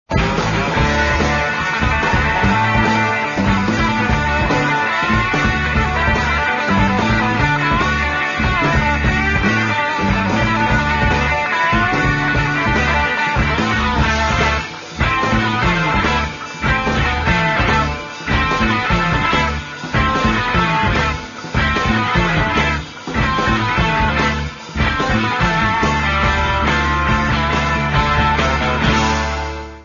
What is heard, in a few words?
guitar